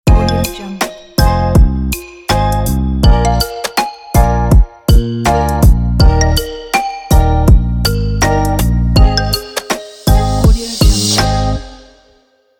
chill hiphop logo

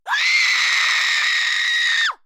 screams shouts human female

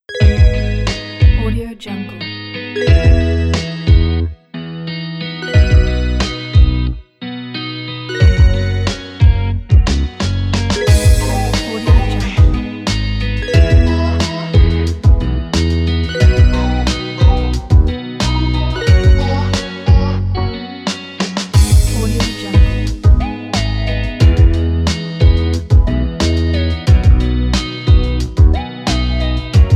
urban hiphop; billyrubin

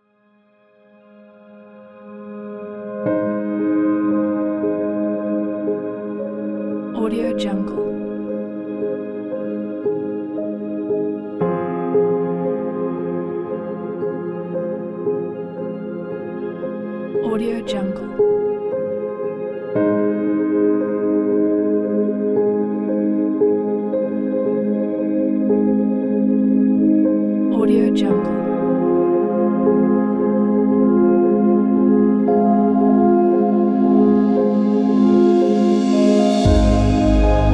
soundscape background

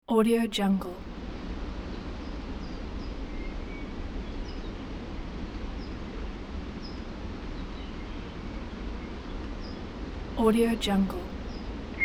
night bird chirp

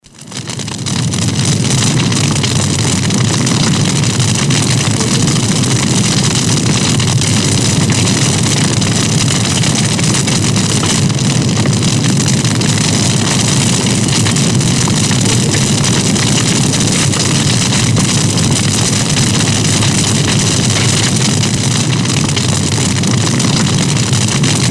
fire forest

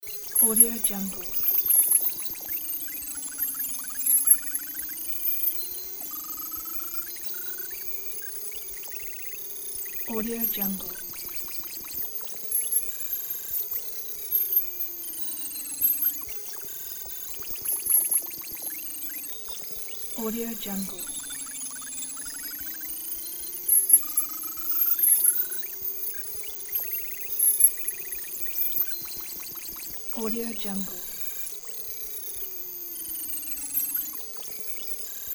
cybernetic digital device

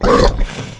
werewolf slobber; monster within; soundmorph

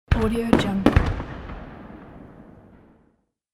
firework deploy and explode

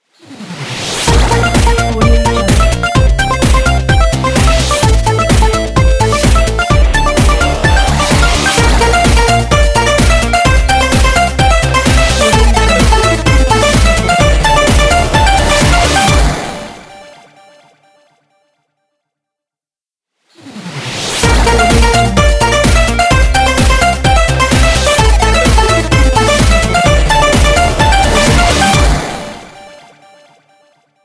8 bit chiptune logo happy

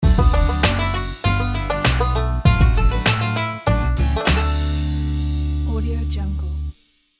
ultra funky banjo logo

